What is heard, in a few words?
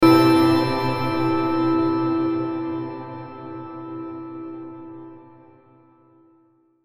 Hit Burst Chime Reverb; Production Element Imaging Element Accent Transition